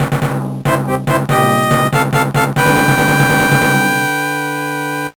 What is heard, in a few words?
Victory Fanfare